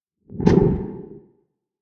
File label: Low Cartoon Whoosh; Whoosh Sound Effects; Accent Sfx For Whoosh, Wherever Swish, Sweep And Swoop Sounds Are Required; Andrew Fleming